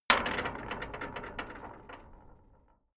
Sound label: metal gate close rattle